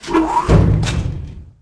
elevator teleport; Tony Hawk's Project 8; XBOX

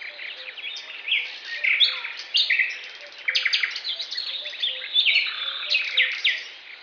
Birdsong Loop

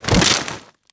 deadfall grass

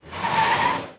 menu out; Cars; XBOX

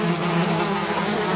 fly buzz multi

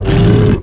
hit; tusken King; Star Wars Obi Wan; XBOX

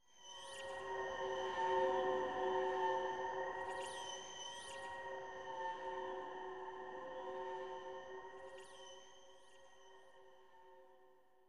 stinger; Myst III Exile

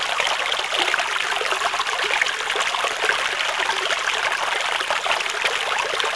watr stream; The Elder Scrolls III Morrowind GOTY; XBOX

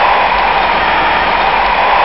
crowd; Total Immersion Racing; XBOX

